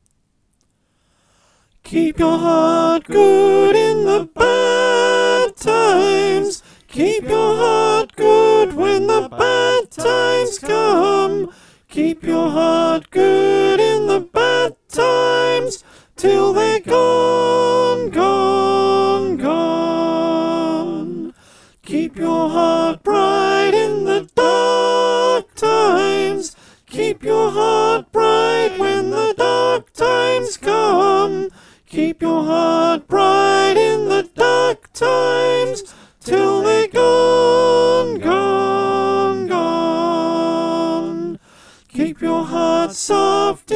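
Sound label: soprano, choir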